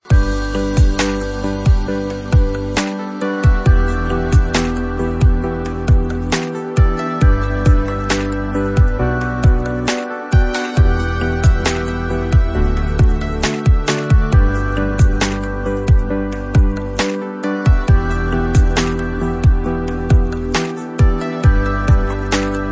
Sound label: romantic